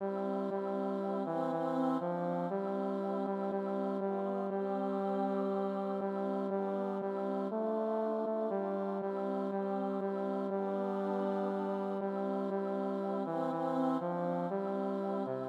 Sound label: Unde et Memores Tenor; Choir